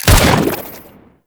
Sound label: Bubblegun Pistol Shot